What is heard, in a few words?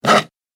Horror Scratch Wood; Wood Sound Effects